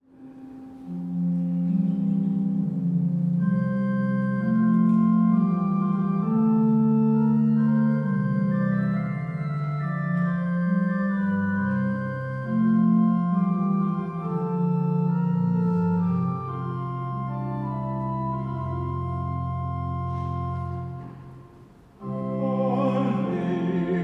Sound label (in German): Zwischengesang Halleluja, chor; Fronleichnam 2024